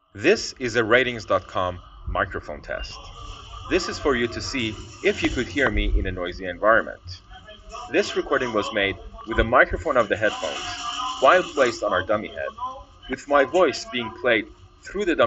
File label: subway